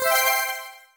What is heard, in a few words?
player pickup key; theGoonies; Retro